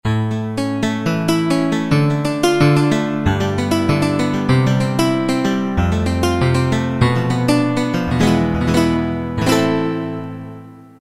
Guitar, Roland MT-32

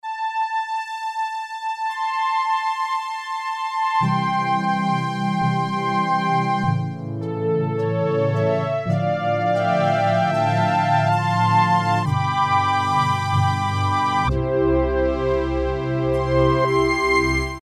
ORCH SPLIT, Casio VZ-10M; Internal factory combi

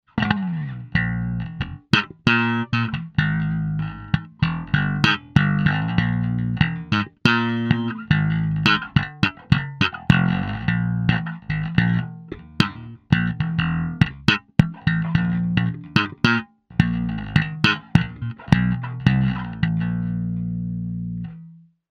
GROUND FUNKY, Zoom 506 II

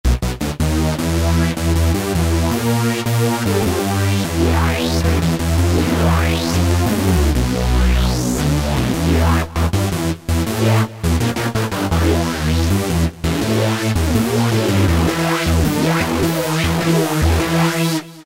NRG Stab, Korg microKORG; Factory Voices

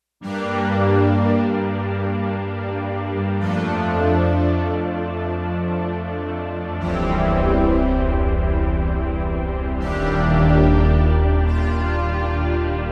Slw B Gtr Strng; Digital Synthesizer

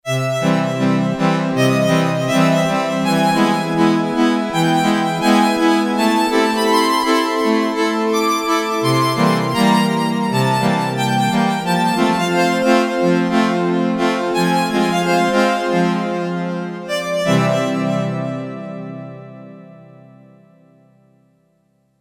Sy.Ensem., Yamaha DX11; Factory Preset Voices

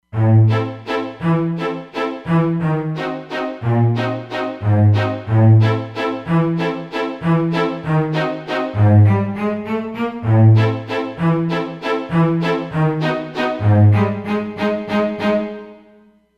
Stacc mp Str; Roland Fantom XR

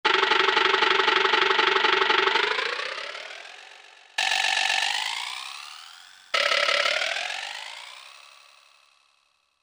Vibraslap, Korg MSC-8S Percussion

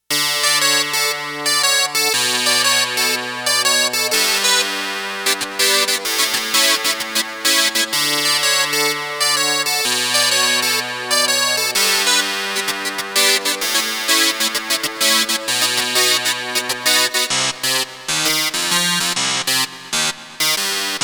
BUZZY, Roland JX-1; Factory presets

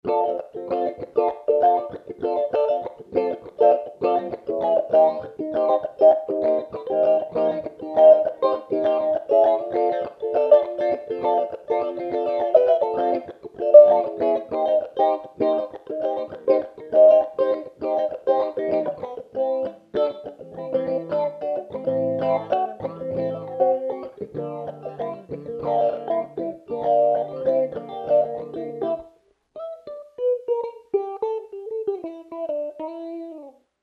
FUNKY PHASE

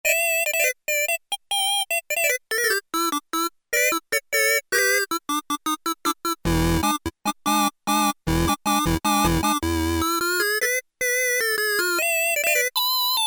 New Wave Organ, Korg PolySix; Factory Presets Bank A

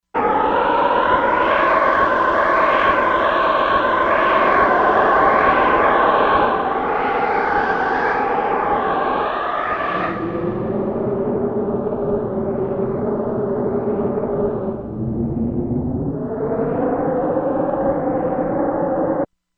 Wind; Korg MSC-16S Environment

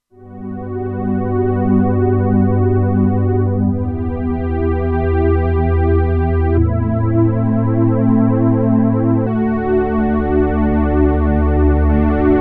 Warm Strng, Roland Alpha Juno-2; Factory 2

